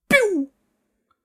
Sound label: laser guns